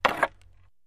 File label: Staff Hits Medium, spears & staffs